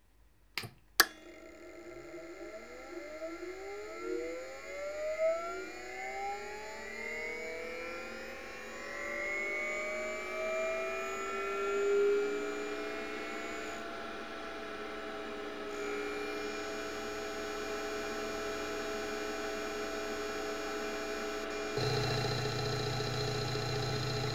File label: Old Hard Drive thing